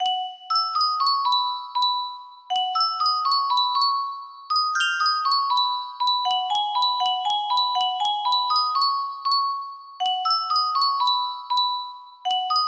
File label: music box